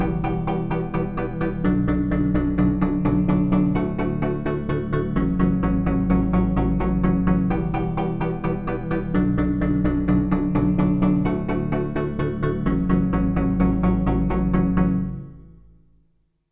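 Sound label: leads fat metallic chords 128 bpm B minor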